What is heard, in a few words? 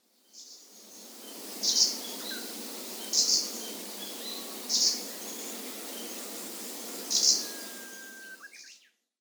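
Arctic warbler, sakata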